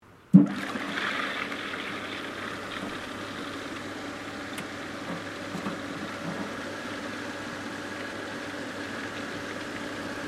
Pump filter swimming pool operating, Fountain pump operating underwater; Machinery; Machines